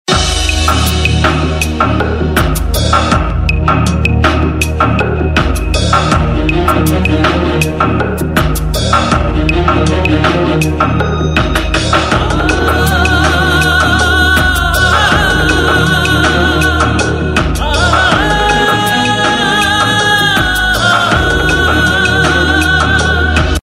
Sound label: Sad BG Tune; Soham; TV Serial Tone; Colors TV; Bani Ishq Da Kalma